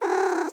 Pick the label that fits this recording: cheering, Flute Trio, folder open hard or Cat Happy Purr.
Cat Happy Purr